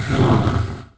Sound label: Hit; The Elder Scrolls III Morrowind GOTY; XBOX; Dagoth